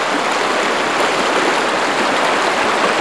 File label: water, ambience; XDM